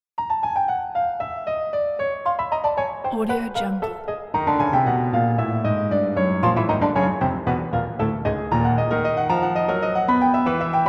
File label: appassionato